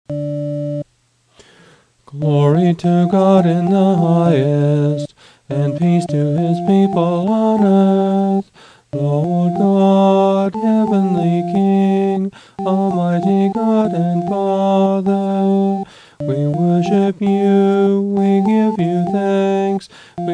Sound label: Mass XV Gloria English, chant; Mass Parts